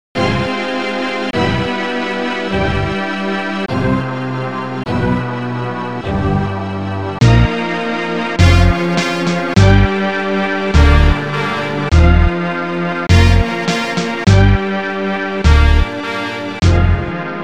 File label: Incoming Message; Judge Dredd; SNES; Type C